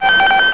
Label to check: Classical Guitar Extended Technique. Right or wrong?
Wrong